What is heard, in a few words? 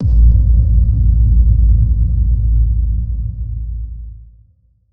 Impact